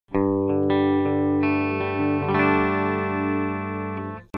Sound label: C1 - Clean (Bridge)